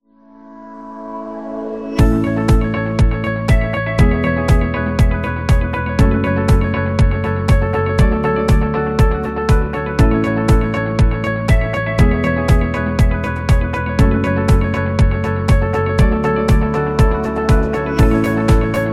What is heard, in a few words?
Corporate Project, corporate music; corporate music vol 9